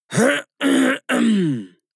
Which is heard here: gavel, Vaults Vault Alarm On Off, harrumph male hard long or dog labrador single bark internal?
harrumph male hard long